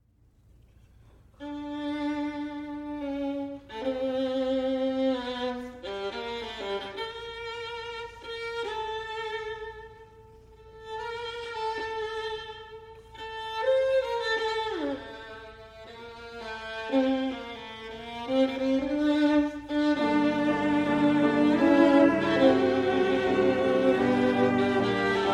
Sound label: Piano Trio in G minor Moderato assai; Bedřich Smetana; Op. 15